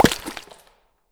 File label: Jelly Drop